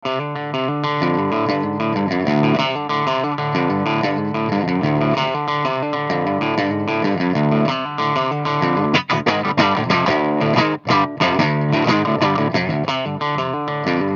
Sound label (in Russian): Запись микрооконечника через кабинет и микрофон; Микрооконечник для игры в линию версия 2